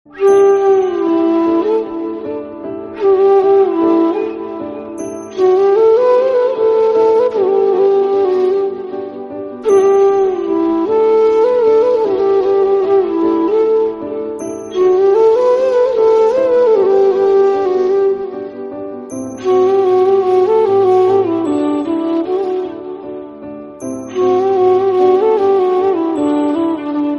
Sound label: piano bgm